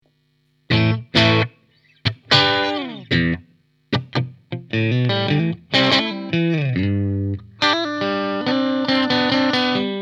Strat Clean; lampes

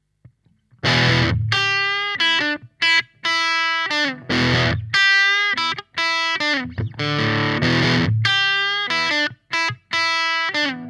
Pentode crunch; lampes

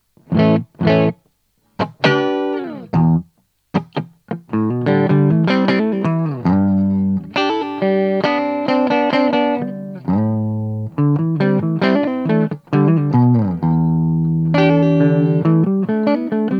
clean; lampes

